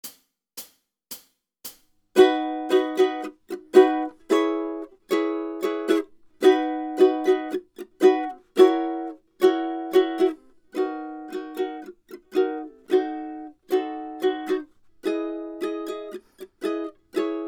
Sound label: rhythm study, ukulele; grade5